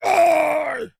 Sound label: Death